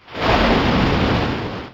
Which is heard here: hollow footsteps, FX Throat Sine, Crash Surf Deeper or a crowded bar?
Crash Surf Deeper